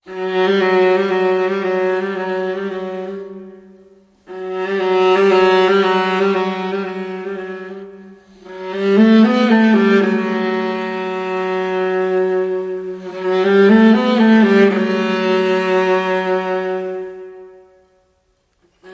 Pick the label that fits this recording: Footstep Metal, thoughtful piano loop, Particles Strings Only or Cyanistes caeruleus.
Particles Strings Only